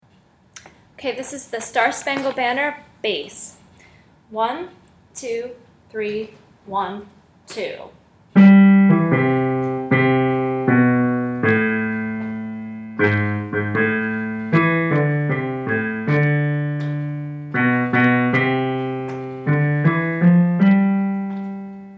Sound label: Bass